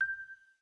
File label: chimes (G)